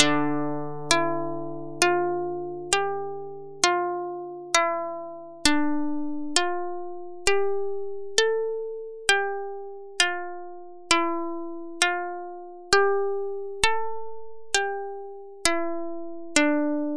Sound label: lyre two voice; hurrian